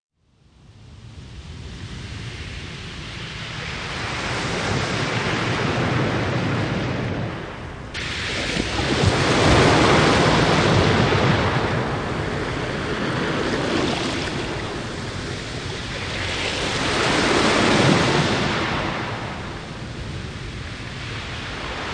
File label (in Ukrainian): shum morya zvuki prirody relaksaciya; more; krasnoarmiysk